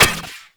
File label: Updated Shot; Warframe; Moa Pet; Multron